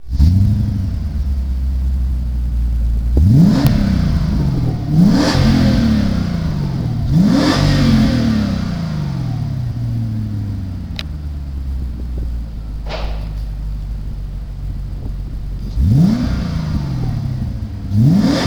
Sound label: BMW E30 v8 4l